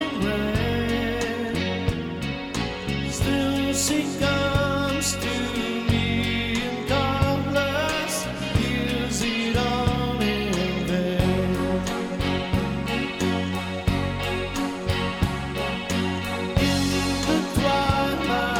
live applaus

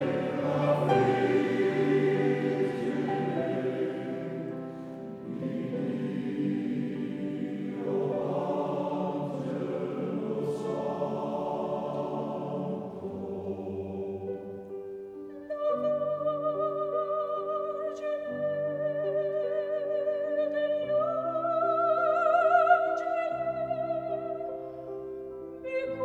Koor (mannen)

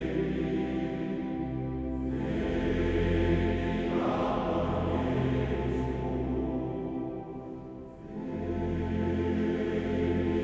Koor (mannen); Chr Residentie

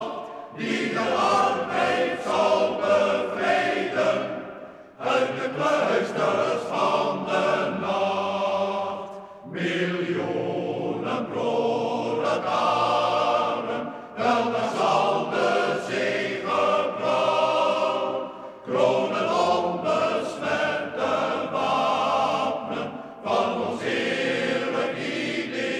Strijdlied, mannenkoor; Stem des Volks

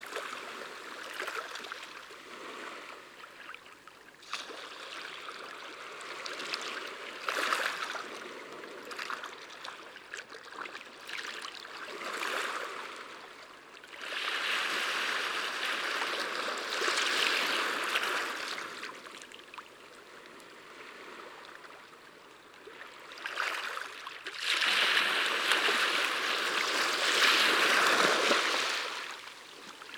Geluid van golven

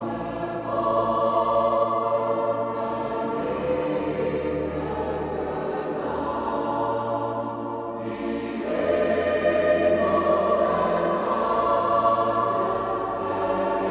Koor gemengd; t`Hierdens

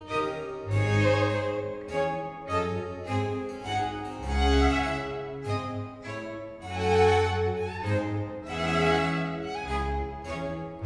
Menuet; Telemann

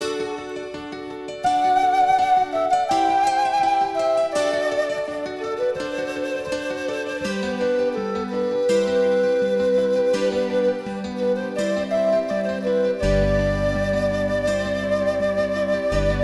panfluit

